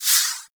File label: Fighter Rager Fire Whiz By; Grineer; Space Fighter Rager Agent